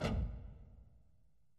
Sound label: bang dumpster; angband